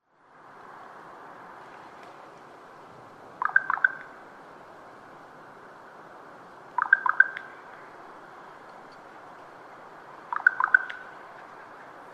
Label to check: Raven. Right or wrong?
Right